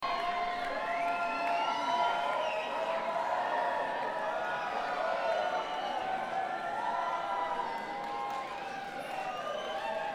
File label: Crowd